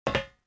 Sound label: metal step, footsteps